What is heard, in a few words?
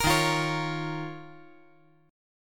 D sharp 7th Suspended 2nd; strummed; JGuitar